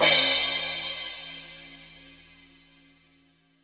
Crash, Cymbals

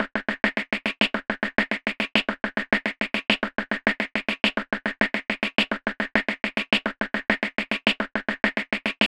Sweet na Ragga, Breaks, 105BPM

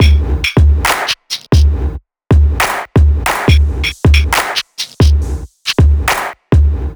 Bpm138 Complicated Break, Dubstep, Drum Loops

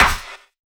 Snare, Dubstep One-Shot Drums

